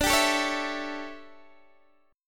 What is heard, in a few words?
D Major 7th Suspended 2nd Suspended 4th D, Guitar, DADGAD